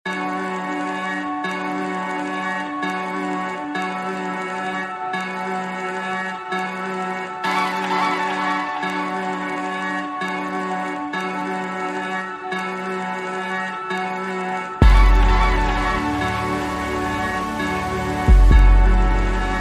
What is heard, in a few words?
Instrumental